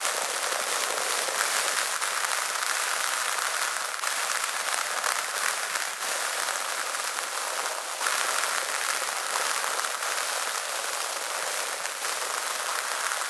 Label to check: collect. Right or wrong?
Wrong